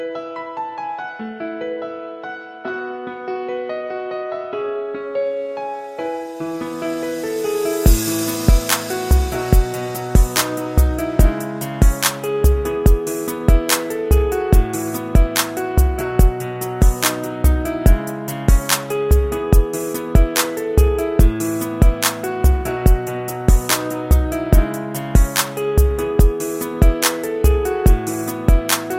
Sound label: piano rnb instrumental